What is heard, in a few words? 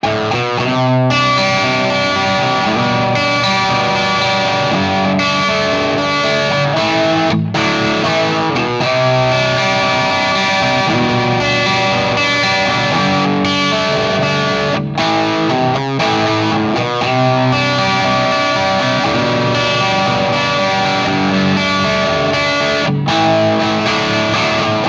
Classic Rock